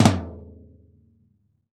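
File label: RAW floor tom, Drums Acoustic; AKAI; 90s sample CD; USB Soundscan vol.10; RAW KIT 3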